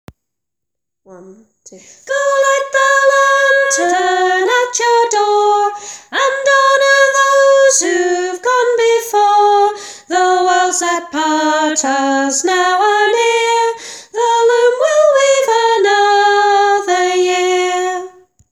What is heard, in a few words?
chorus; loom; daisy